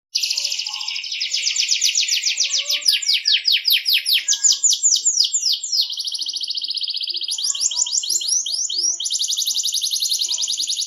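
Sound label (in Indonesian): kenari, canary; kenzo; om ujang misbach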